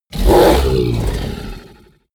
fishman vocal snarl; kreaturen

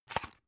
drug open; arzt

